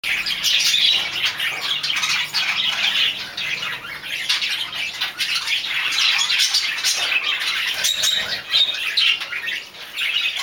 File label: budgie, dutch